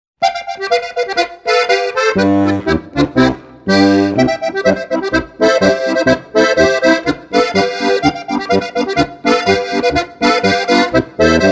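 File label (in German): Begrüssungspolka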